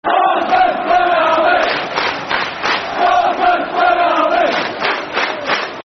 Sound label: Blue And White Army, Football Chants; Walter Smiths Blue And White Army; Rangers GRFC